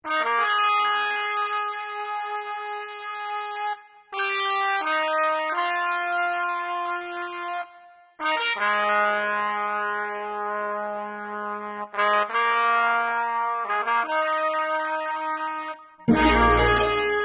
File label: FANFARE